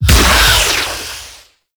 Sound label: Health Leech Activate; Warframe; Eximus